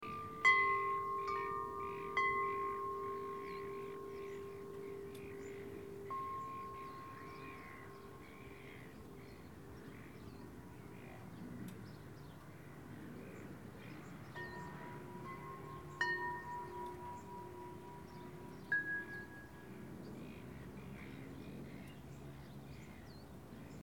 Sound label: Quiet Windchimes Distant Birds